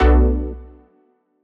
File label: stab, One-Shot Synths; TRUTH-HZ; Crowdsourced Sample Pack